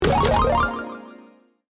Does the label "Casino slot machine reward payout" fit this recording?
Yes